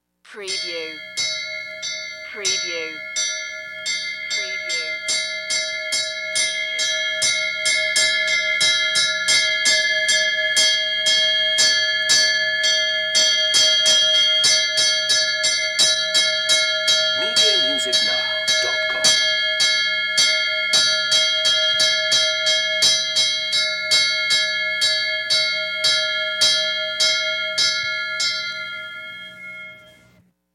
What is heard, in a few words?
Fire Truck Bell